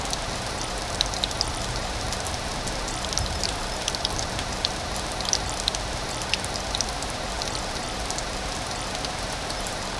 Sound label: looping retro game ambien